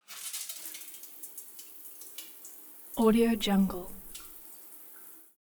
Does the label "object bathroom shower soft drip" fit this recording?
Yes